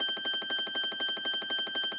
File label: win count up; temple of nudges